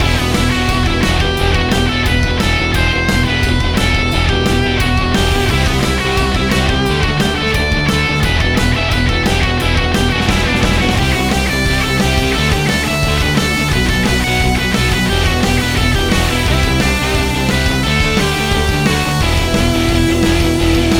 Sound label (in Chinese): 战斗血战